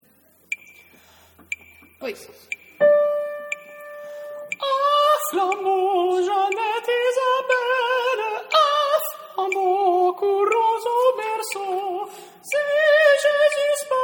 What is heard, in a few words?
un flambeau part